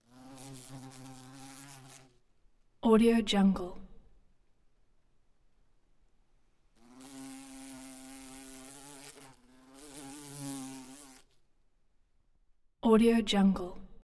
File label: bumble bee flight wings close